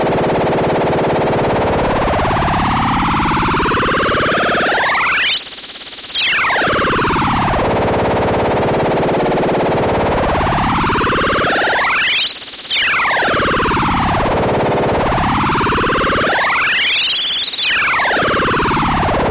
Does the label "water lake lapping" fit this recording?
No